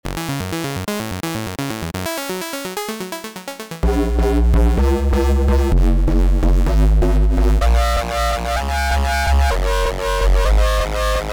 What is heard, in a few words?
fm opl; hive 2020